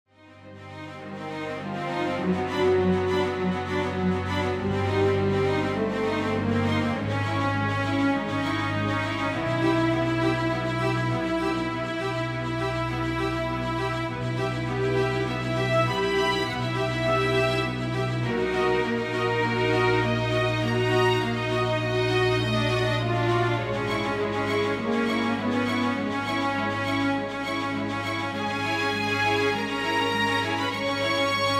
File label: String Orchestra